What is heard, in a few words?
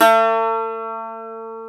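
ethnic plucks, saz; Eth Plucks, Pluck Duo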